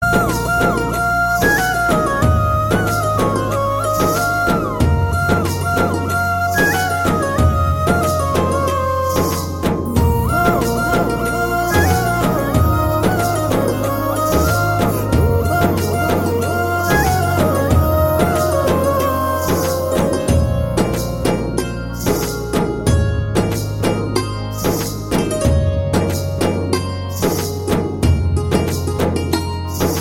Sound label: love bgm; Tamil